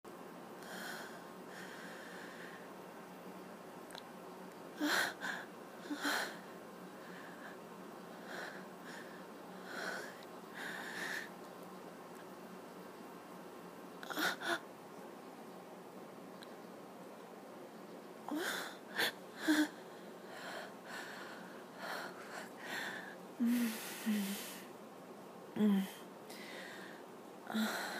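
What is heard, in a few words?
female, short and sweet, breathy moans, giggles; F4A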